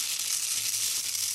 Geräuschewerkstatt Rieseln kurz; Geräusche zu den Klangexperimenten

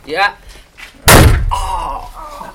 Knall arrrr; Knall...arrrrr....

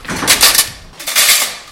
metallisches Rascheln

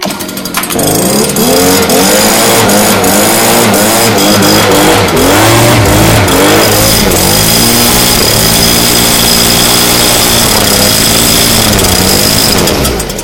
Laubpuster; Schüler-Podcast; Klosterbergschule; Klangexperimenten

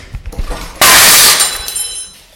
metallisches Klirren

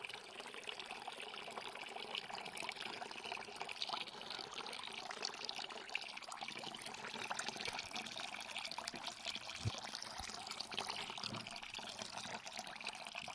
Wasser sprudel lang; Geräusche zu den Klangexperimenten

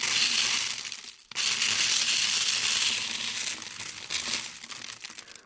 Geräuschewerkstatt rieseln mittel; Klangexperimenten

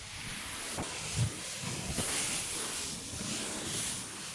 Geräuschewerkstatt Wellenrauschen; Klangexperimenten

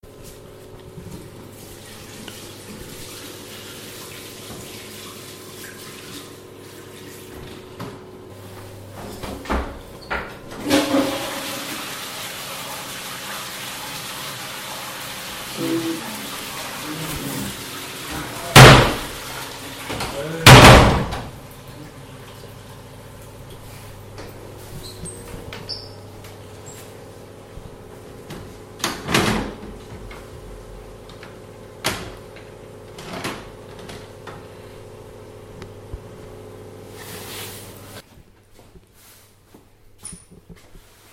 Toilette; Toilette der Jungs; Schüler-Podcast; Klosterbergschule; Klangexperimenten

